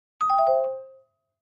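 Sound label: Clavichord Short Major; keyboards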